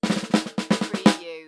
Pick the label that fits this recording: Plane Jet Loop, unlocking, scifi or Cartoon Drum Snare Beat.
Cartoon Drum Snare Beat